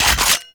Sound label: Finisher Shotgun Pump; Symbilyst; Warframe; The New War; Kahl; Weapons